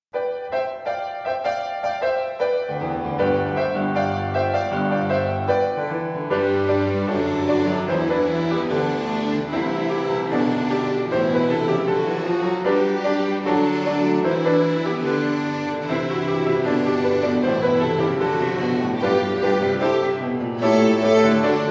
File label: Bow Rock